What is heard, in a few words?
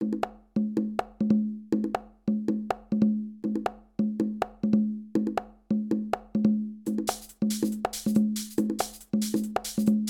Deep house monotonic